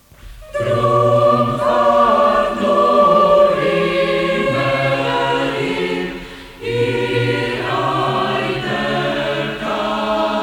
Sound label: Drum fahrt